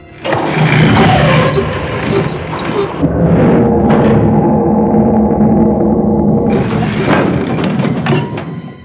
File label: load torpedo; Loading Torpedo